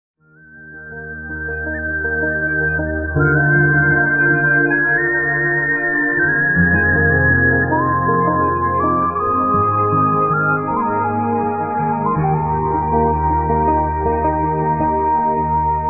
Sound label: penny whistle